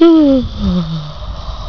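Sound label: yawn female; PEOPLE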